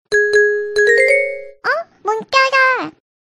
Cute Tone

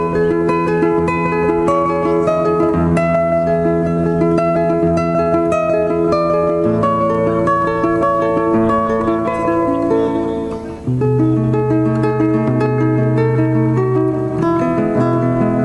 Romantic Classical

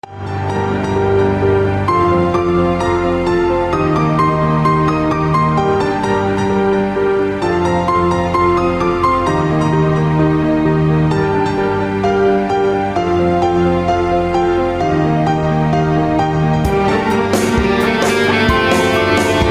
Jingle

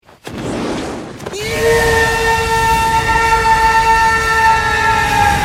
yell; Present Mic

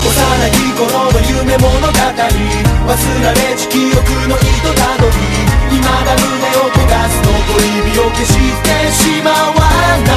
Korea Song

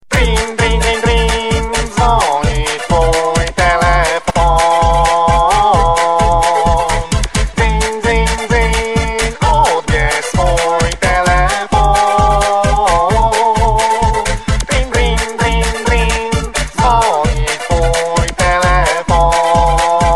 Disco

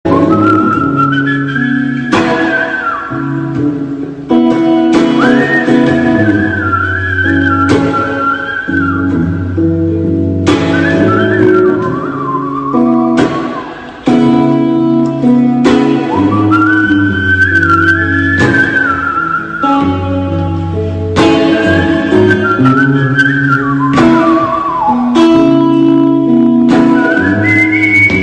Whistle rnb

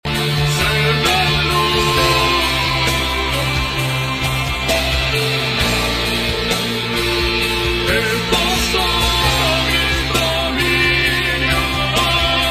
adagio

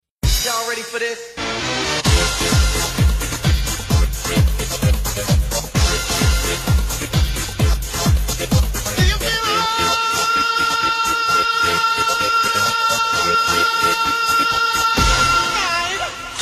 Hockey Pump

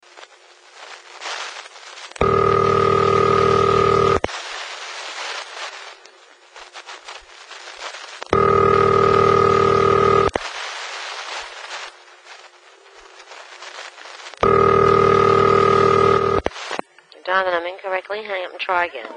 New York Telephone

